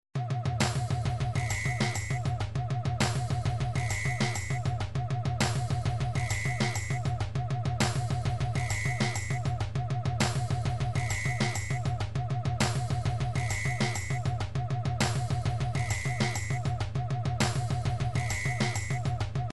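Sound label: drums pattern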